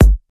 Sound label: loud kick drum single hit g sharp key, steel kick drum sample